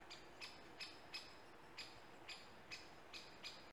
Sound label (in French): Alerte, Pic Epeiche